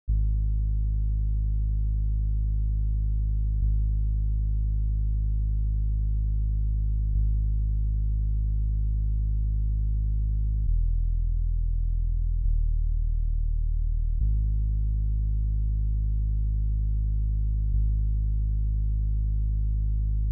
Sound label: 136 BPM G# Min Bass; Trap Melody Loops; Loop Stems; Cymatics; Nightmares Trap Melody Loops; Division